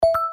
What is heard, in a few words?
Keytone others; T390K Wine 3G; LG